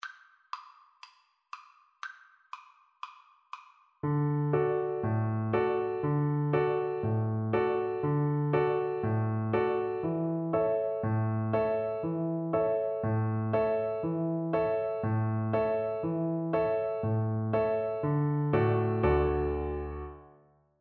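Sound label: VLC karaoke, Cello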